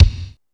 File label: SP1200, drummachines